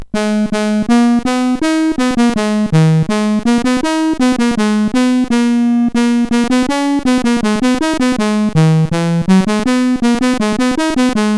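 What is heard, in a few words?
Pipeline BASIC, MOS 8580 SID chip music, games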